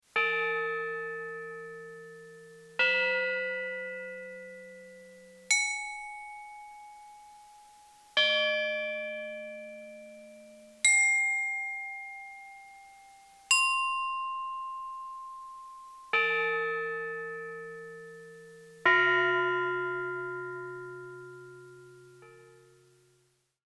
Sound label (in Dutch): klokken